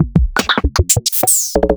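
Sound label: Roland MV8800 drum NUHOUSE KIT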